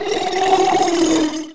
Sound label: moan